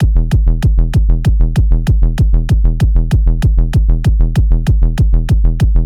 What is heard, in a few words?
Kick Bass E